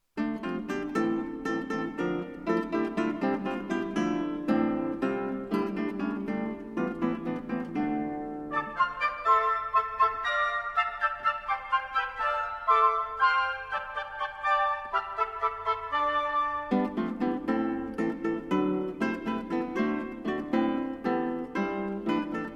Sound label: Guitar Pastorcico